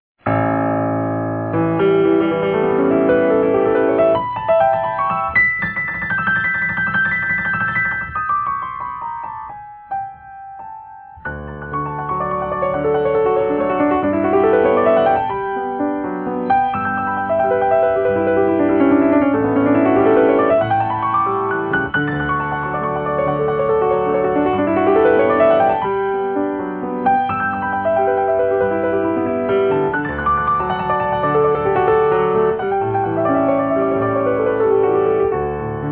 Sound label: royalty free music waltz